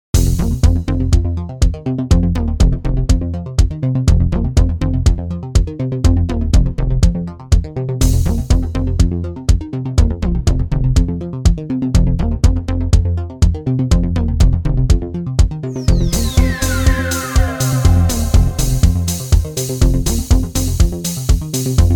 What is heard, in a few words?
best electronic ringtone